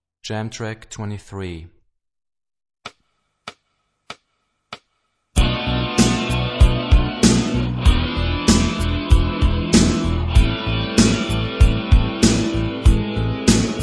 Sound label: Rock guitar; Rock gt Secrets